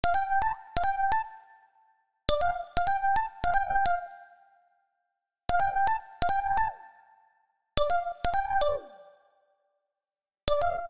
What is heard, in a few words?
Mallet Synth D Minor 88 bpm; MIDI Clips; Tonal; Melodic; Ableton; Factory Packs; Chop and Swing